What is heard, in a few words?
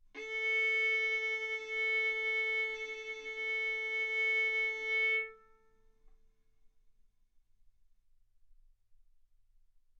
Violin arco A4 B4; mf; sul A; stereo